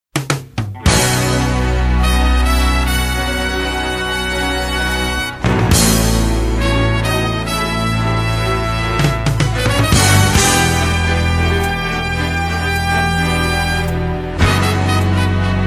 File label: jingle